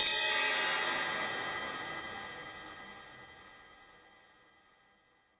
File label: Ride Ring Mid Verb